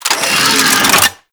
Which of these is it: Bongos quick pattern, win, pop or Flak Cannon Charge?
Flak Cannon Charge